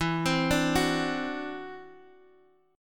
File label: E 7th Suspended 2nd Sharp 5th E, guitar; arpeggiated